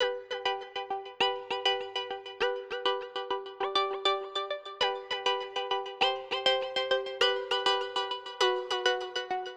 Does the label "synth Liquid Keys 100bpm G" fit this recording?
Yes